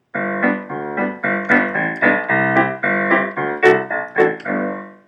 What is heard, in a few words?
G D GGABC G DCBAG bass and chords, simple piano recordings